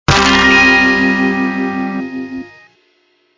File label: Eggsteroid break; Angry Birds Space